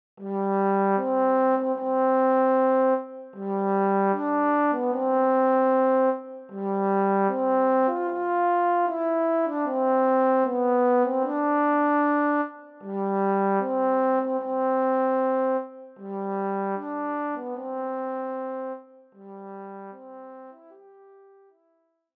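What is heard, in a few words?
solo trombone; Hochzeitsmarsch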